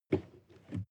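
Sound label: sports car interior pedal brake short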